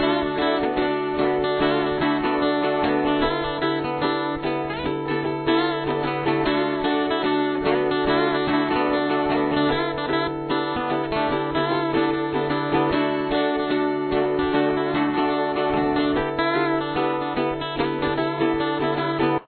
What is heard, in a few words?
solo